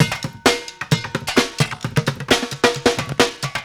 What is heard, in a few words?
Street Groove, Ambient Grooves; Sonic Foundry (Sony Creative Software)